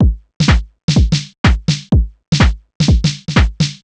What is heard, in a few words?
drum triple stripped 125, Drum Loops, Classic Chicago House